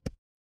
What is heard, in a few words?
impact thud